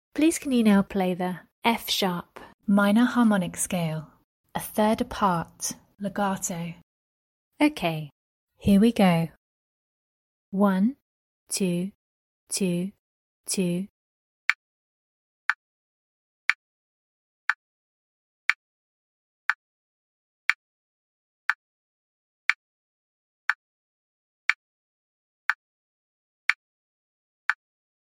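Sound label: f sharp minor harmonic scale a 3rd apart legato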